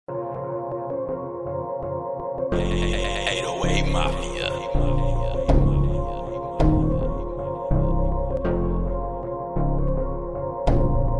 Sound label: Instrumental